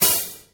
sparkle open hat sound f key, drum single hits one shots